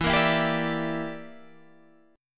E Minor 7th Flat 5th E; Em7b5; strummed; 12,13,14,12,15,12

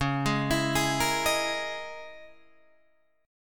C sharp Minor 6th Add 9th, Guitar, Drop D

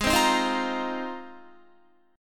G sharp 6th Add 9th, Guitar; G#6add9; strummed

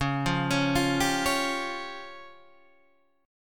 C sharp Major Flat 5th

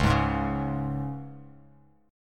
C sharp 5th, Bass Standard; strummed; C#5; JGuitar

